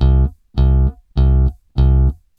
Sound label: BASS, ROCK POP; MAGIX